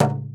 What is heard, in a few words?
LOG, INDIAN PERC